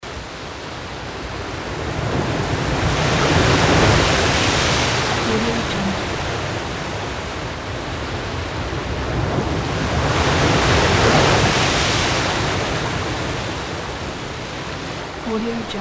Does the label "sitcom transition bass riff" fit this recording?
No